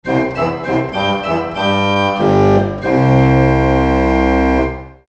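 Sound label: organ pedal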